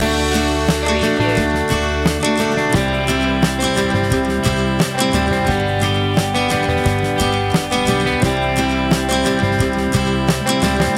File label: tap your knees loop